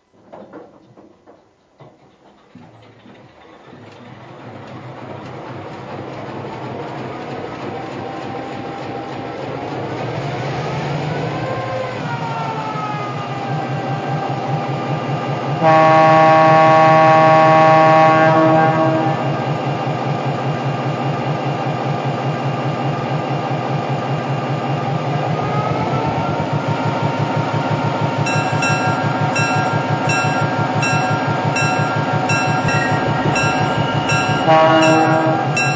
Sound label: GP-7